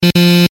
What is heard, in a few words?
beep beep